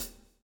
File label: SWNG